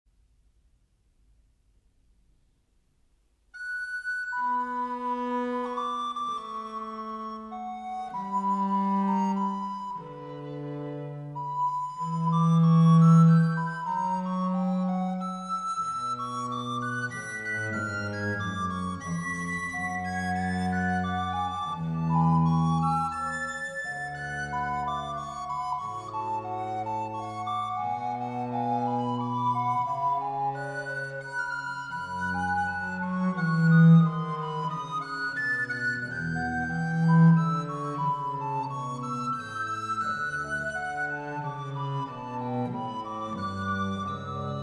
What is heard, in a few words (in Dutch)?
Bach orgel triosonate Es deel 3; Johann Sebastian Bach (1685-1750); Orgeltriosonate in Es; Adagio